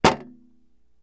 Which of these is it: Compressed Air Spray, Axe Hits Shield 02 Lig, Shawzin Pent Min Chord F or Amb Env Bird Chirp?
Axe Hits Shield 02 Lig